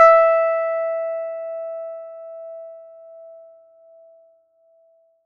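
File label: plucked electric guitar E5; Polyend; Simulacrum Sounds; 1 Shots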